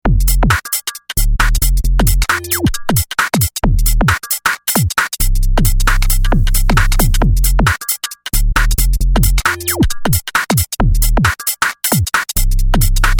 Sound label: 2 Step